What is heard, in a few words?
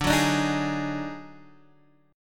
Eb Minor Major 7th Double Flat 5th D